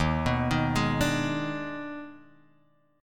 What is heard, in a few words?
D sharp Major 7th Suspended 4th Sharp 5th D sharp, chord; x,x,1,4,3,3,4,x; chordshape